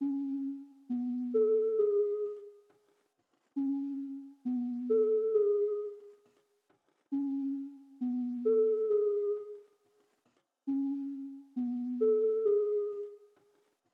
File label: 135 BPM F# Min Counter Keys; Cymatics; 2022 Melody Collection; Eco